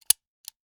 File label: Foley Toy Gun Revolver Firing